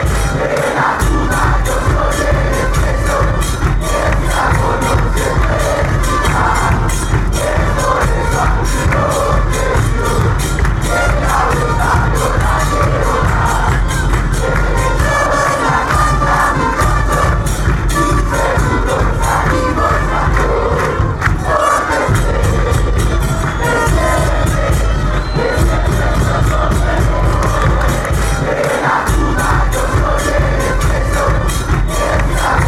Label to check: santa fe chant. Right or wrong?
Right